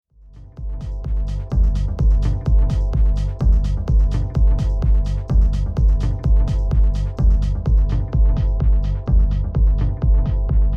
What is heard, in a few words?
Pioneer DJS 1000 LPF